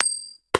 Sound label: coin